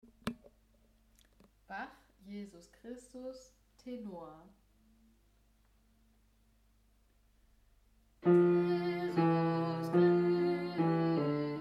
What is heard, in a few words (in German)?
Bach Jesus Christus Tenor; Reformationstag 2025 Kantorei; Übetracks Vergnügt, erlöst, befreit 2025